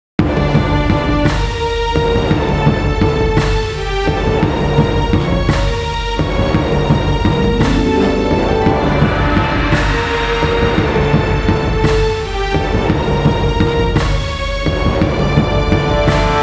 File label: No Choir; Shockwave Sound - Fantasy Worlds Vol II+III